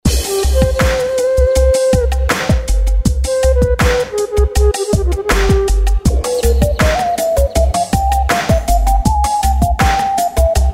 ARABIAN BELLS; Suite Coll. Vol.8

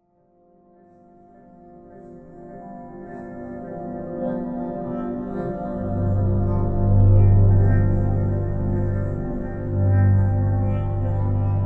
PIANO SWEETNESS, New Age; New Age Coll. vol.5

